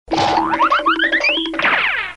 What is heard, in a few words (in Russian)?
Звук бега из мультика